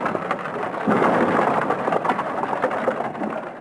wheels; DAoC

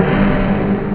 brass

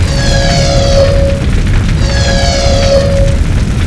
earthquake; starship trooper; music; Nejlepší České Hry

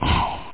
slime

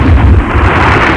collapse; PSL Monthly Shareware CD-ROM (Public Software Library) (January 1994)

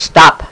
STOP

